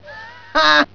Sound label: hhhah